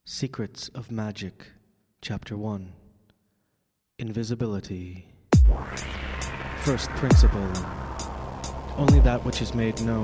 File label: Magic beats